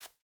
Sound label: footstep burlap concrete jump start; Rust